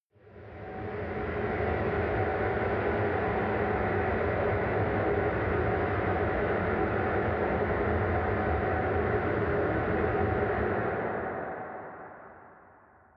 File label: machine room